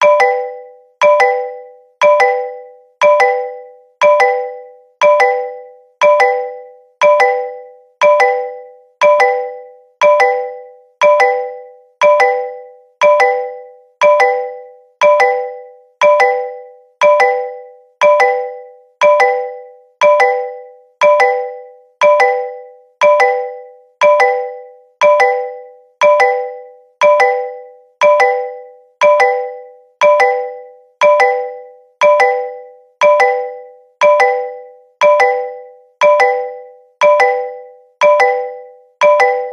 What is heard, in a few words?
Alarm; Sharp; AQUOS sense10 SH-M33